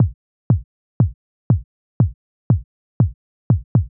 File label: Kick Lite; hydrogen